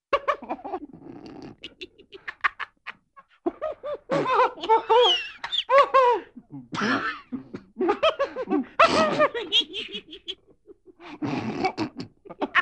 Funny Suppressed Laughter - Men - Boys Laugh, Cartoon; Hollywood Edge; Cartoon Trax